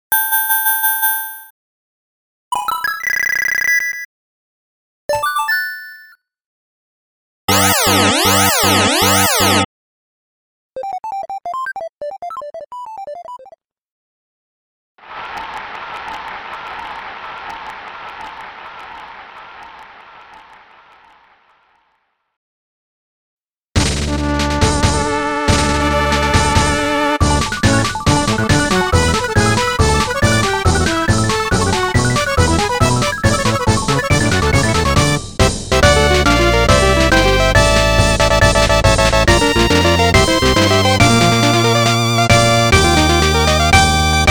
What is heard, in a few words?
Jingle Collection; super bomberman soundtrack collection kaboom